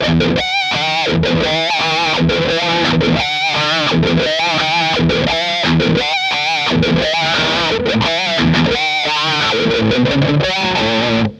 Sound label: lp bridge wah tubeking eq